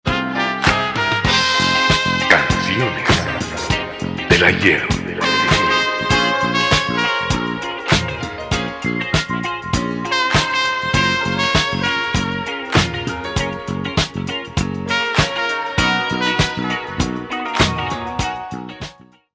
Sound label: ring tone